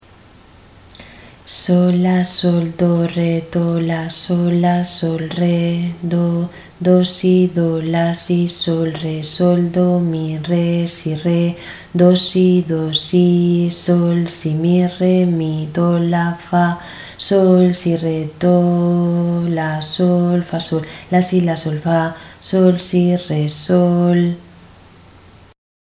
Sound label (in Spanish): ritmo 6-4